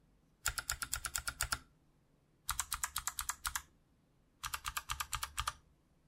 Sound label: Kailh Box White; SGK50-S4